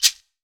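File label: maracas, short; Cuba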